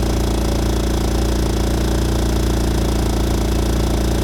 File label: KART IN ON IDLE, kart